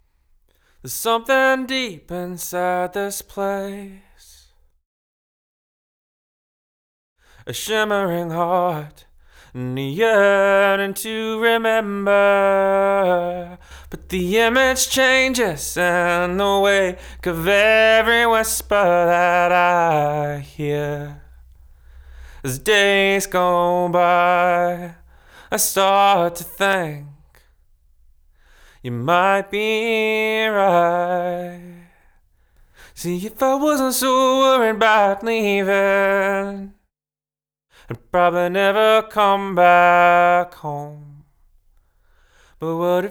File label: vox